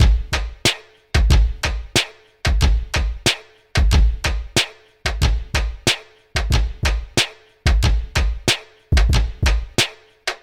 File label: Drumkit Hip Hop Break 92bpm; LANDR